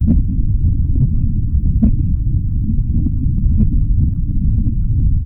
blubber

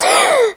gasp; player

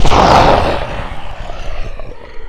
death; player

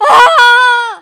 death; player

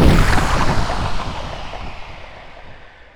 falling; player

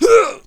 death; assassin

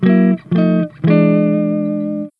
flag capture opponent

